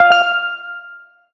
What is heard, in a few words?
tonal oneshot F; Pop; Slate; PASADENA Pop Sample Pack; Tonal One Shots